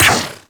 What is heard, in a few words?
Drone Laser Fire; Weapons; Warframe; Corpus; Super Moa Drone; Artificer